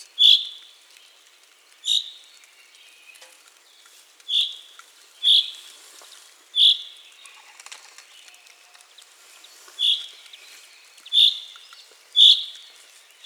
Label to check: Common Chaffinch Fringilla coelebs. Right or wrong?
Right